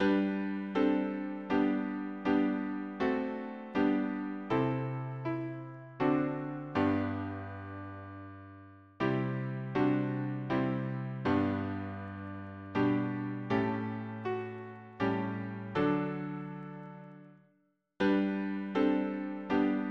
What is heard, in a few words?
harmony piano